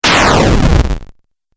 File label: Fireball Video Game